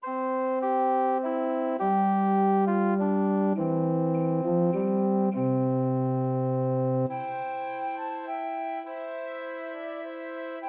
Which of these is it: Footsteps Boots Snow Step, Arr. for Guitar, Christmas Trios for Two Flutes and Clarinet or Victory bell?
Christmas Trios for Two Flutes and Clarinet